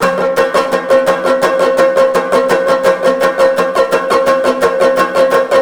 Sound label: banjo; zombat; danger